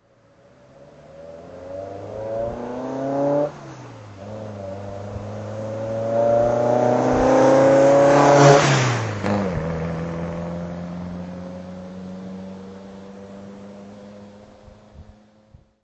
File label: ah sprite weber driveby